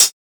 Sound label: hat, drum sample; Hats